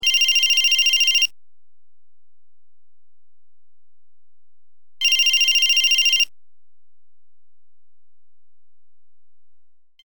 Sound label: 4 ring cell